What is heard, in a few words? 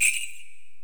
Korg x5, Drums